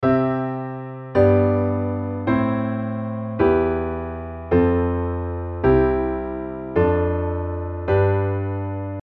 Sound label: piano canon